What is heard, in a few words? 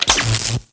fuse, buttons; vendetta